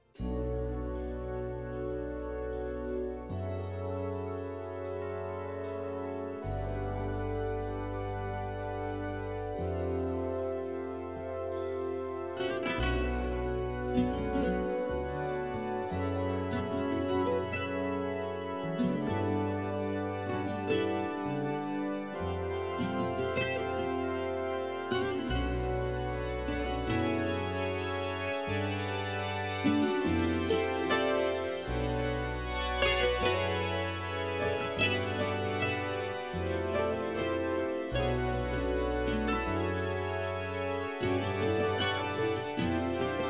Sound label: Chinese (Instrumental)